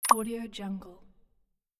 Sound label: button